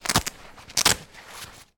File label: undoing 2 velcro fasteners on toddlers winter coat; foley